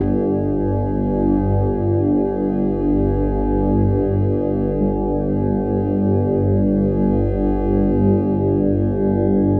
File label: 100 G Drone Dark City; Zero-G - Future Wave; Drones